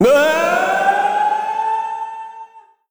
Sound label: trapdoor; Crypt of the NecroDancer